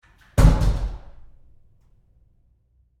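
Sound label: Door Bathroom Stall Close Roomy Medium; Household; Big Room Sound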